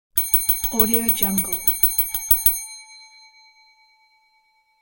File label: call bell hitting nervous several times